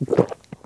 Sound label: barnacle gulp; hl2